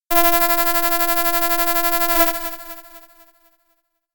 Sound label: Beam